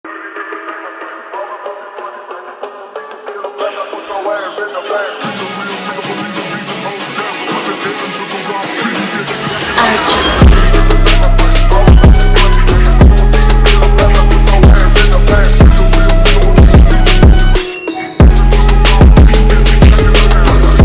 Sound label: Phonk remix